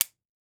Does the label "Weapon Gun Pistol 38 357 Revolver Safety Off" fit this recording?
Yes